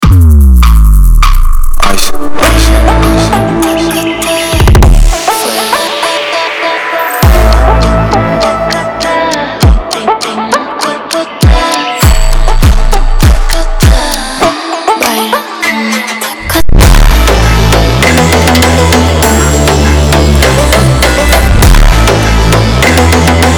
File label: funk taka